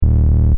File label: Scifi SFX Loop, Short; Stuart Duffield